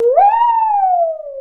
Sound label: saw danger, music, zombat